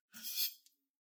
包丁を優しく取る音

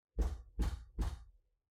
扉をノックされる音

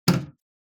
壁のスイッチを押す音; switch

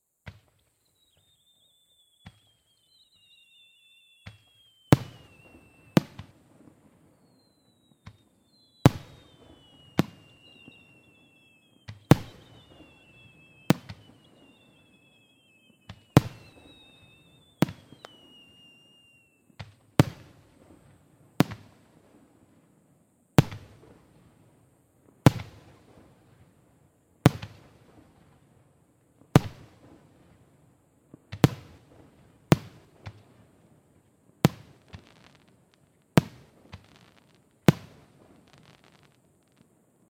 花火の音; firework

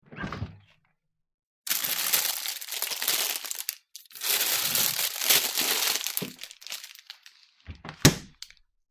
冷蔵庫の食材を取り出す音

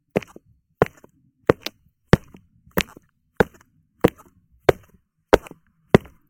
スニーカーでコンクリートの上を歩く音; sneaker

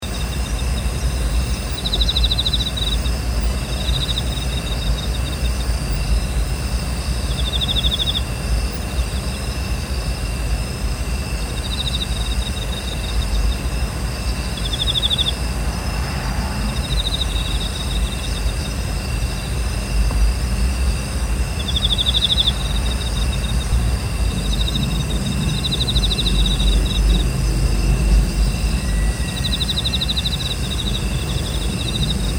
森 虫の鳴き声

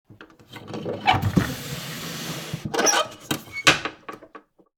日本の民芸箪笥タンスの音; chest

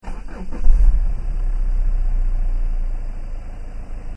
トラックのエンジンを起動する音

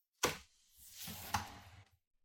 シンクの下の引き出しを開ける音

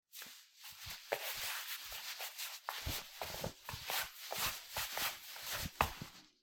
フローリングを歩く

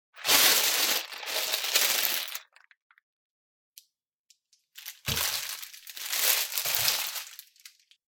まな板に食材を置く音

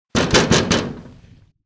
教室の扉を叩く音; door

